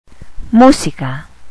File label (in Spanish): musica